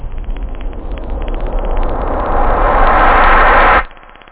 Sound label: whoosh; da capo vol1